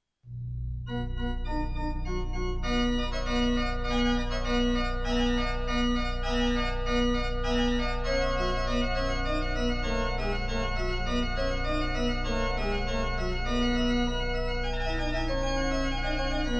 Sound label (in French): orgue Toccata Offertorio